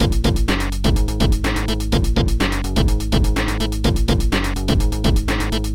drum org; OctaMED SoundStudio V1; mug-members

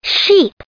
sheep

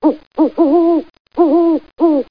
Owl

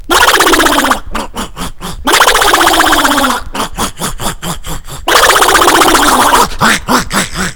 FUN DUCK; L'Effet Pommier - Volume 04

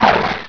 PAIN; HereticII; Heretic II Demo

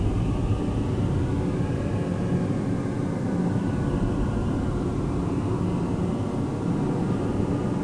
big wind

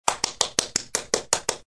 one person clap; Survivor The Interactive Game; Player

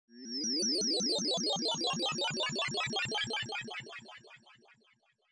you lose

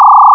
trill; Piston

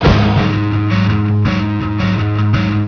Synth sound; Homer

